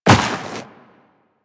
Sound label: Walk Snow, Footsteps; Warframe; Tenno